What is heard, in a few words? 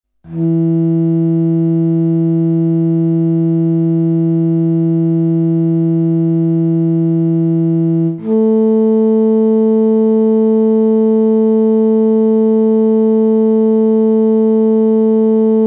tuning guide, bass; guitar-bass